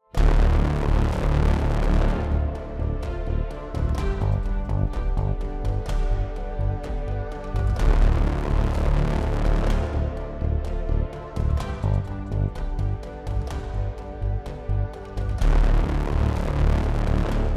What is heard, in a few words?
Cinematic Epic Driving; amperAI